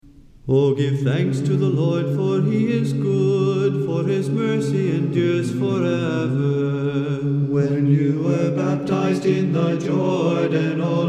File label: Antiphon; Theophany